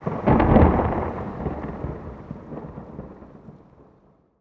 lightning Right; netent

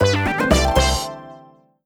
Win Medium; secretofthestones

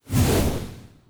win sweep Vertical; netent

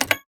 button click; netent

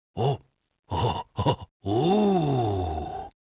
red panda excited; netent

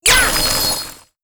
Blue Light Attack; netent

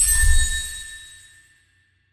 free Spin Intro Click; netent

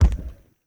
reel stop

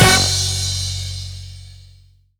first Spin; netent

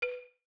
button click positive; netent

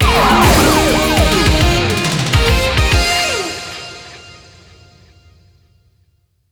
freespin end; netent

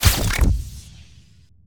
substitution Symbol Un Burst; netent

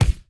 counter impact

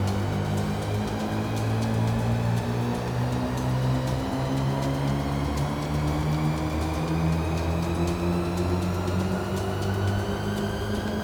bigwin; netent

